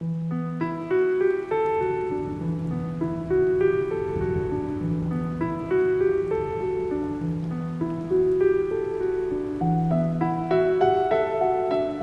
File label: Ocarina Piano; Video Game Music; Rainy Forest